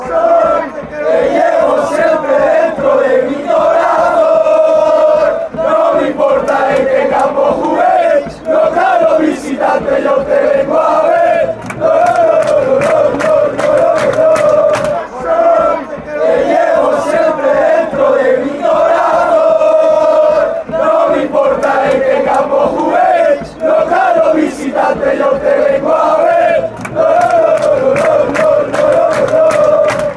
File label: heart fanchants